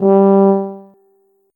tuba note

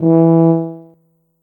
tuba note

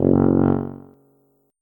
tuba note

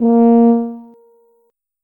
tuba note

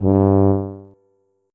tuba note

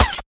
laser impact, weapons

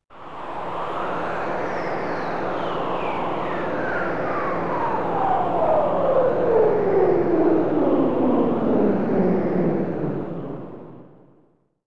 ambient

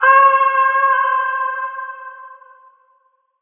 falling

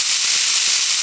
electro fly, weapons

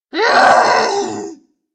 pain

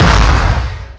fire, weapons

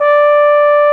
tuba loop note 26

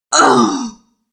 death

